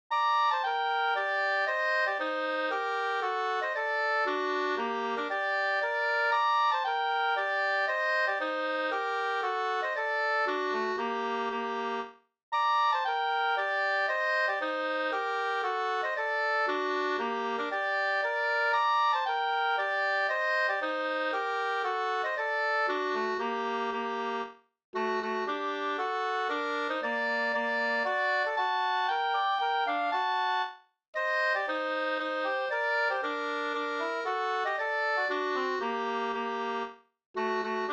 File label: mazurka; fr Gammalsvenskby 2st